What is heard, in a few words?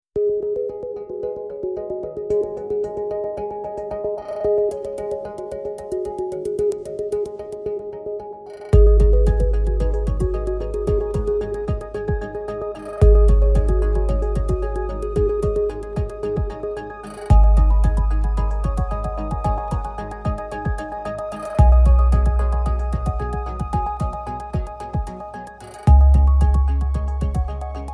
Positive Progression